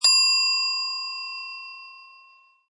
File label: Cartoon Bell, Bright idea or hotel lobby bell; Comedy/Cartoon BELL 01; Funny Sound Sound Effects; Lynne Music